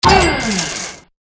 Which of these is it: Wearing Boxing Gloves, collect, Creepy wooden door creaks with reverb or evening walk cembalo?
collect